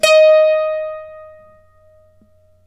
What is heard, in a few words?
d4, Mandolin; Terkelsen